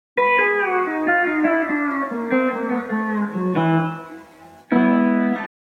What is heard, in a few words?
half speed